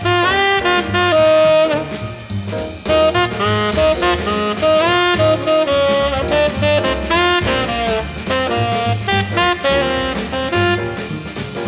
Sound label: jazz